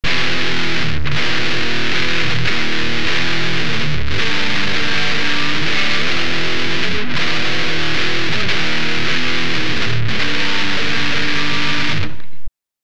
guitare satu, metal

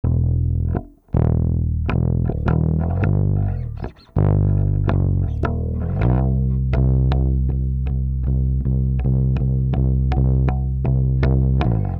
basse, metal

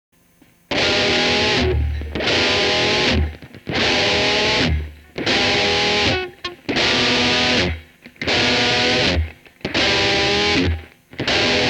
guitare satu, metal

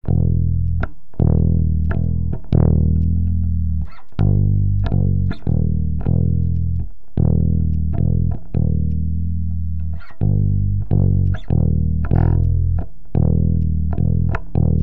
basse, metal

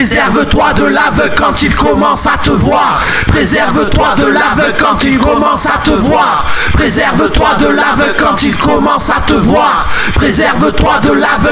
Voix ref

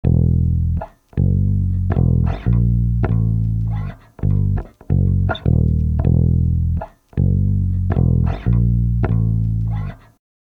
basse, metal

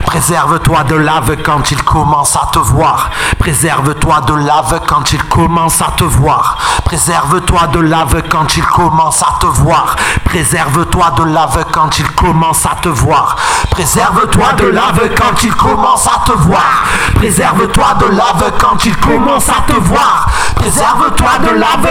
Voix break

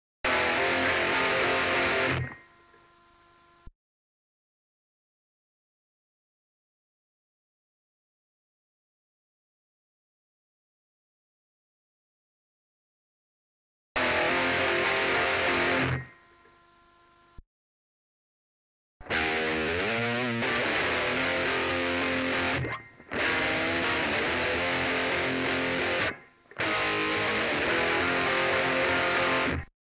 Guitare Satu

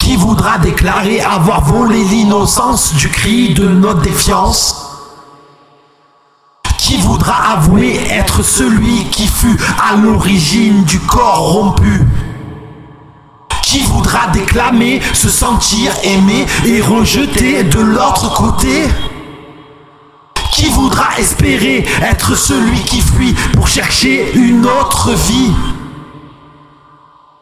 Voix couplet

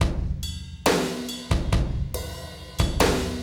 batterie

